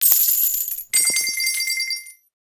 Coin Fly

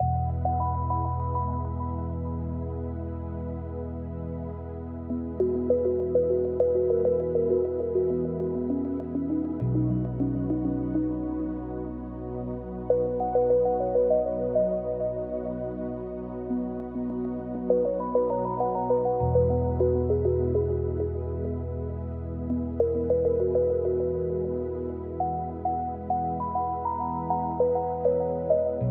music game

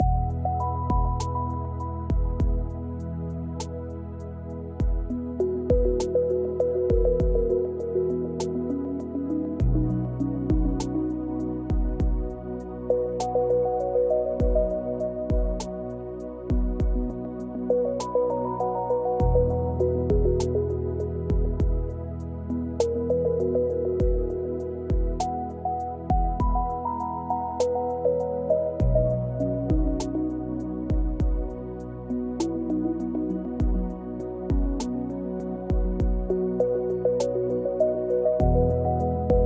music home loop

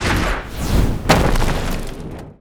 Destroy Box

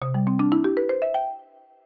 level clear

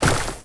Devil Attack Shield

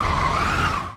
Box Move

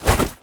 Anim To Weapon

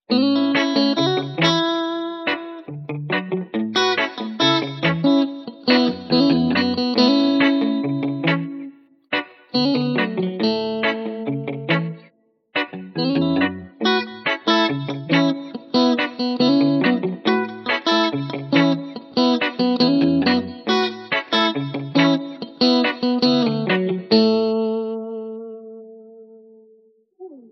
music home guitar

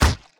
Damage By Bullet

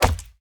Damage By Arrow

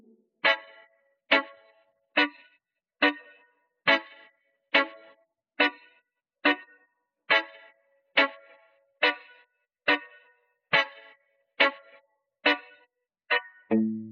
music home guitar

